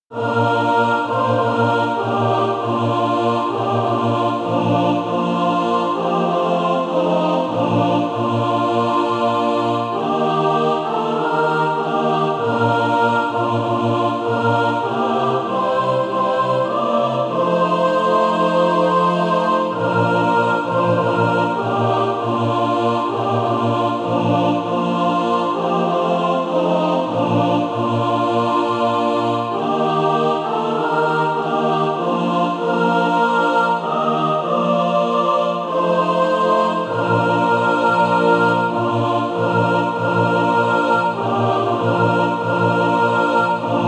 mixed; SATB

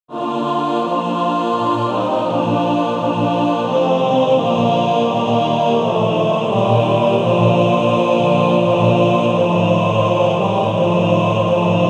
Male Choir

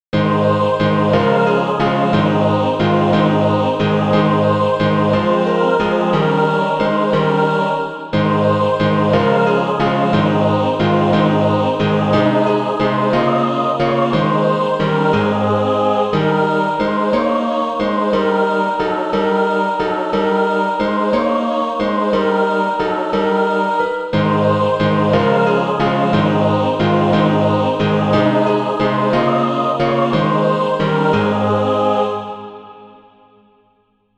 mixed

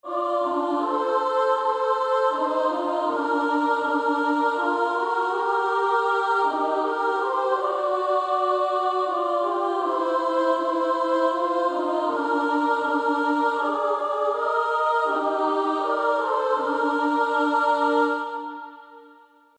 Female Choir SA; Jesus Calls Us

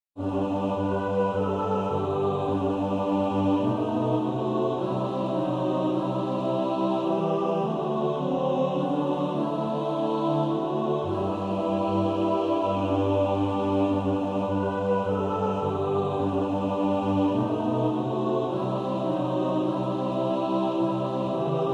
mixed

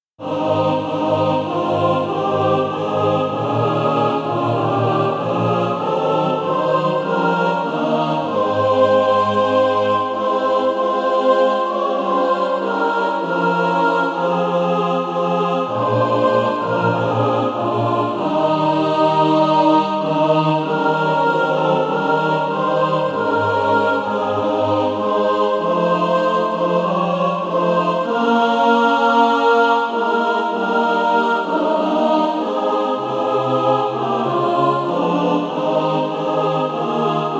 mixed; Eternal God Whose Power Upholds

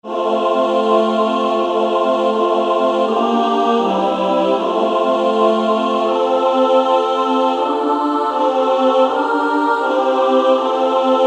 Female Choir

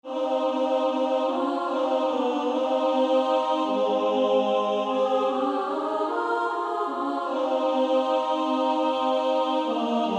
Female Choir; SA

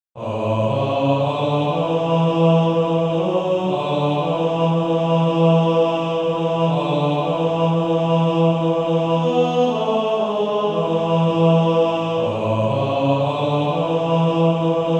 Male Choir

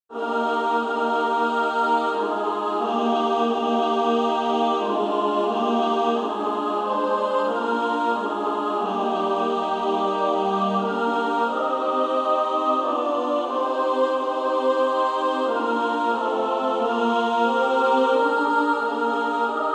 Female Choir; SSAA

